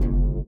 LIQUITAR; Spectrasonics; Metamorphosis

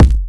noise kick

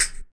RSS PERC

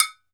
TOKE BEL, African Drum Percussion; E-MU Producer Series Vol. 7 – Old World Instruments

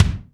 BONUS BD, Drums; Best Service; Real Mega Drums VOL-1

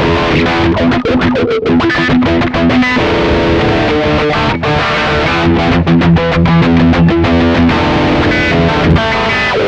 THRASH WAH, Funk Guitar; 90 s Sample CDs; Zero G

